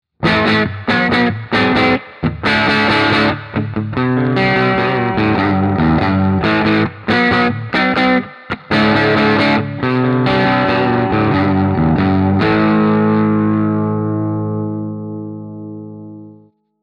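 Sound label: crunch rock middle, guitare; indie totalnatural; très rock et exploitables même avec de légères saturations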